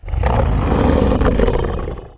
worm roar; Emperor - Battle for Dune

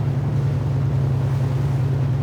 ship idle light cruiser; Hearts of Iron IV Death or Dishonor

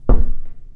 rubber tire impact soft; physics; Garry's Mod; hl2

